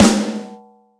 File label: big room snare drum sound g sharp key; snare drum one shots